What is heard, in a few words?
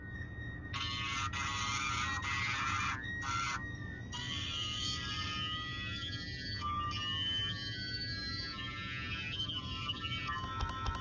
Squeal